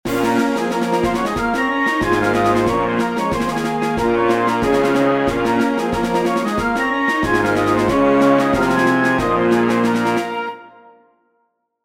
Concert Band Piece

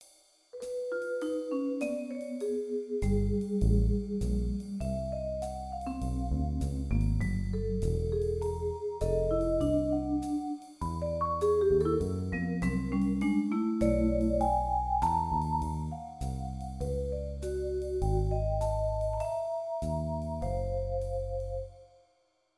JAZZ FREESTYLE

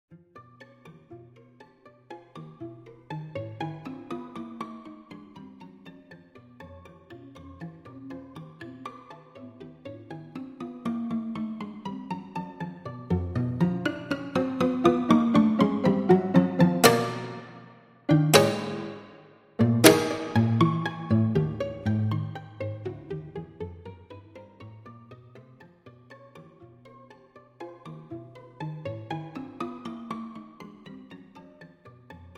Microtonal String Quartet Scherzo

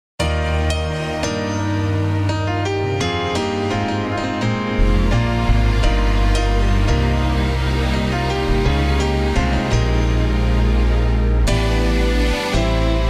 piano; VIP symphony orchestra rendition

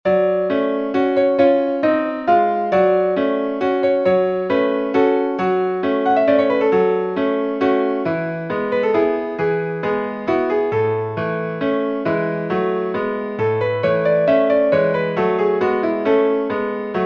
Piano Song F Sharp Minor

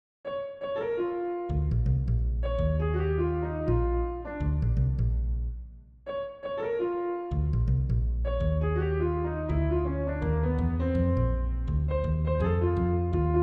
Big Band Blues; a blues theme jazz chart and big band arrangement; First Blues Exercise

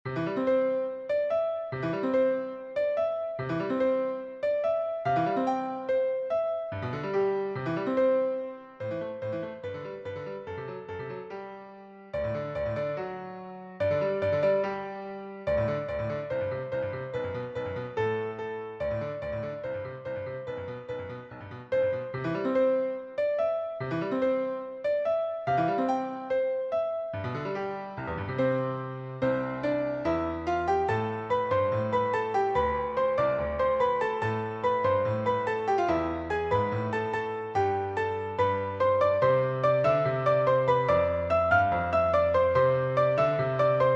Allegro, sonatina in C major

